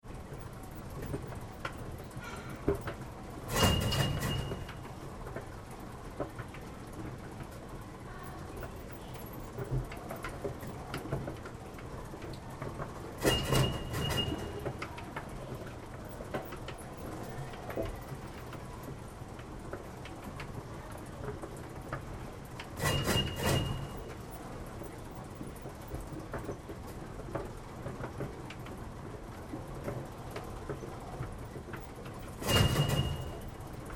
Escalator Conveyor Bells Machine Punch Metal Clunks; Escalator Sound Effects